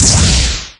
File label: Hit Magic